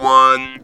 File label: Talk Box high